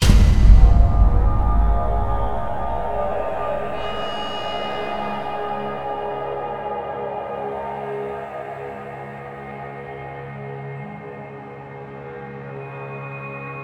Start Stinger

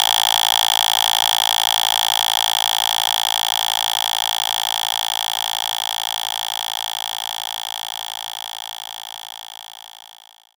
TR 808 Click

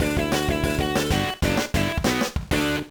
Jingle, gba; Backyard Baseball 2006

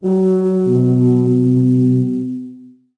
Scrolling Lighthouse

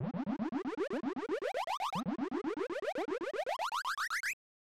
Geyser Flowing; Joe Mac 2 Lost in the Tropics; snes

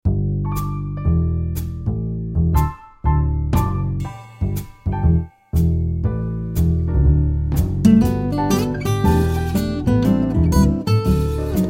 Acoustic tune

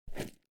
weapon zoom

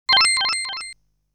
KEY sound acquired; legend of hero tonma

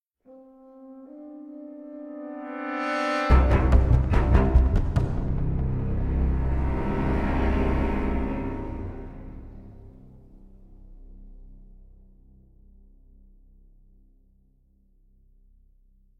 String; Assassin's Creed Unity

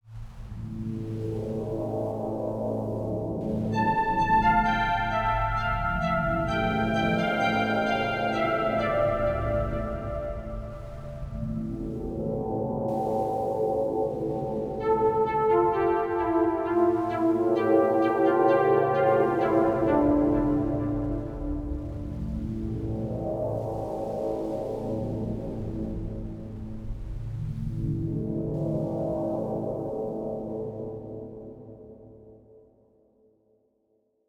Day Stinger

